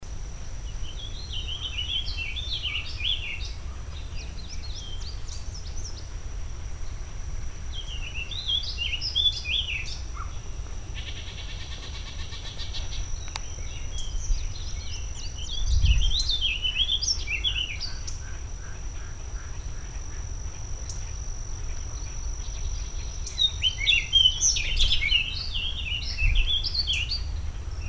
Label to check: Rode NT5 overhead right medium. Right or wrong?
Wrong